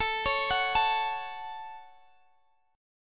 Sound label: C sharp Suspended 4th Sharp 5th A, chord